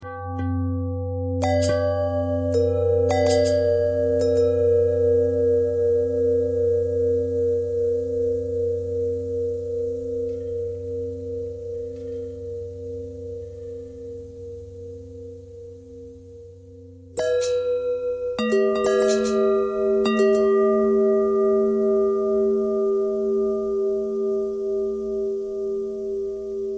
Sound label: long bell bell bend threads; bells